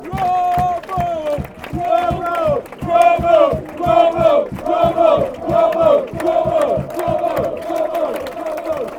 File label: stevenage fanchants; borough